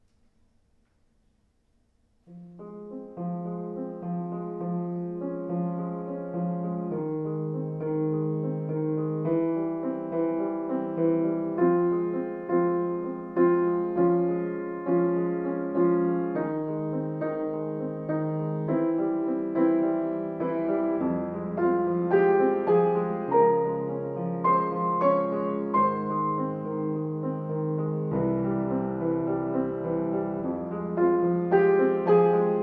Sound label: piano